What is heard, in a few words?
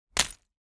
impact rock stone hit small rubble